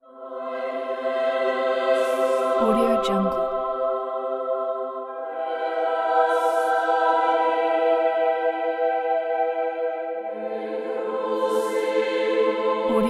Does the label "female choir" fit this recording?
Yes